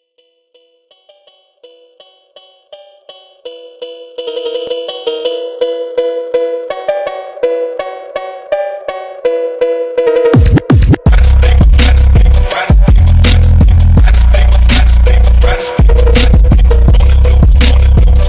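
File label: bass for speakers jbl, check your speaker on bass; basi dlya kolonok jbl 1 prover svoyu kolonku na bass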